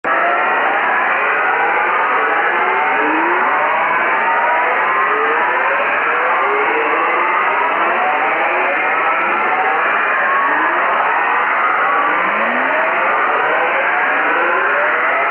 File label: Static Cycling Rasp